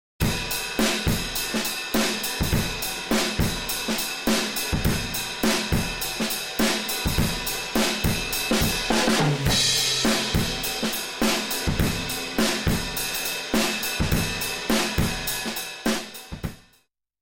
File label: Drum; Retro Instruments 176